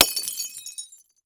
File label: Glass Cup Broke By Hatchet; Crashes